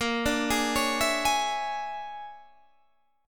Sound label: Bb 7th Sharp 9th Flat 5th Bb Guitar DADGAD, chord; 20,17,18,18,19,18; Bb7#9b5 arpeggiated; JGuitar